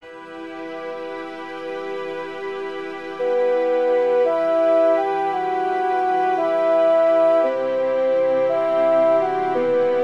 Melancholic Intro

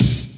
Kick

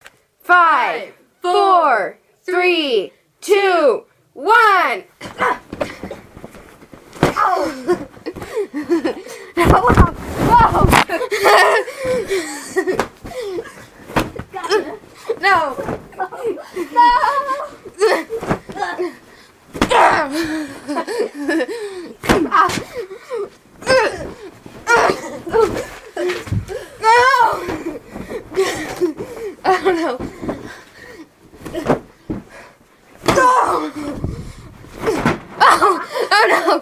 Pillow Fight

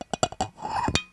Rubbing Glass

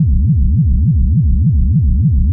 Weird machine

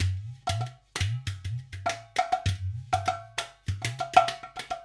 Udu For Loop; Udu Pour Boucle

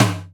Snare

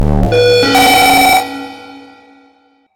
Broadcast News Keyer

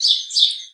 Single Bird Chirp

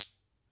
Rattle Magnet

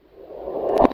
Portal

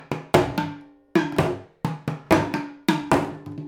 Improv Percs 2 Bars 130 Bpm

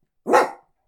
Dog Woofing Single

